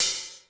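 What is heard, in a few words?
HIHAT open; Crypton; DrumBase5000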